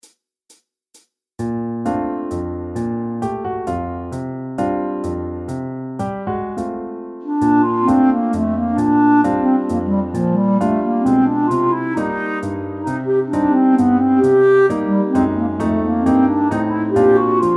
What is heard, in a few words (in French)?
CLARINETTE; PETIT AIR D'AUTREFOIS